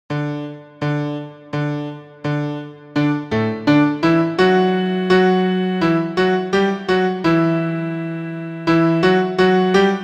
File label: Articulation Study trom